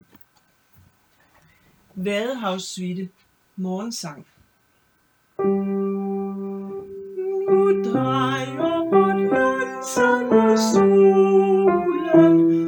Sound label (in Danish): bas; morgensang